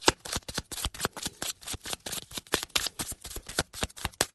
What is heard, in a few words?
card dealing; spidersolitaire